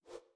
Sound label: Panel Open